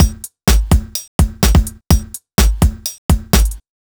Electro Pulse Drums, 126bpm; Zero-G; Soundclash; Combi Loops; Nu Dance Electro

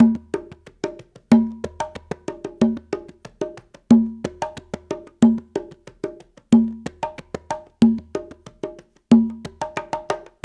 93 GUA, GuaGuanco; Spectrasonics - Supreme Beats - World Dance

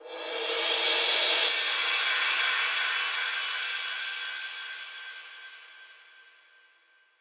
Reverse Cym, Cymbals

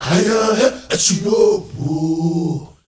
MALE GRP, CHOIRS; Spectrasonics; Heart of Africa

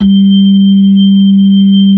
B-3, Hollow; Keyboards of The 60's and 70's

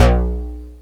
POWER, BASS; East West; Global Sounds

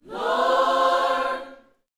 Vocal, Gospel, PHRASE; Spectrasonics

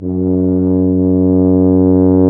TUBA; Sound & Vision - Gigapack I CD 2 (Roland); Brass&Woods2

